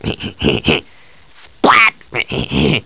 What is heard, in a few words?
splat